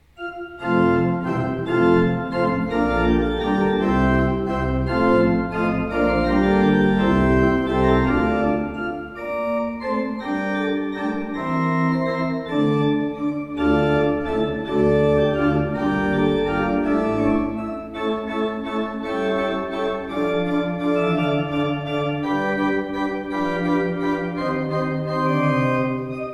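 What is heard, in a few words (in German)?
Kleuker 15 II P, pfeifenorgeln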